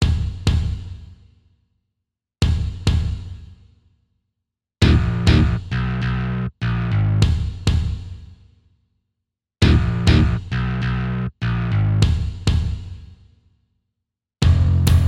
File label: Pipes